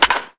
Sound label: Baseball Bat Crack